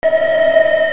SCIFI

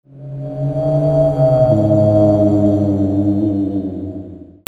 CARTOON

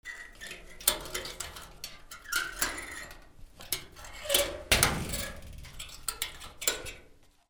HOUSE

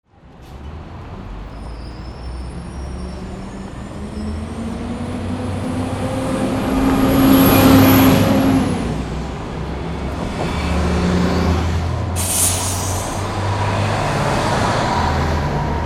VEHICLES

